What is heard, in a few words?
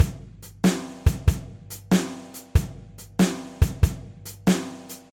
clean drum shuffling musical tone